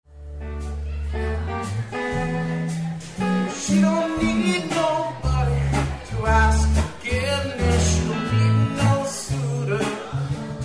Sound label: soft